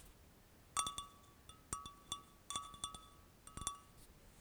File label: Ceramic